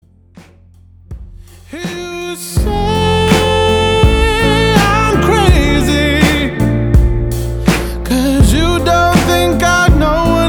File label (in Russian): приемы вокала плач пидыхание cry